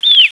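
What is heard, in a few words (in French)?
cri alarme aérien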